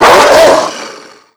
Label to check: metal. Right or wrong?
Wrong